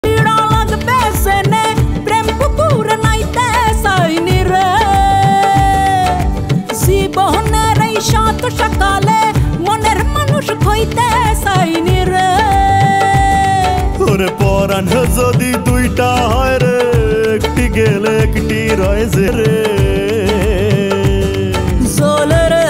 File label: bengali ringtone